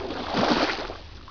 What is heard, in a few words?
Game Catch Bass; FishingBass